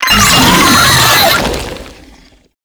Proto Infested Brachiolyst Attack, Vocals, Enemies; Warframe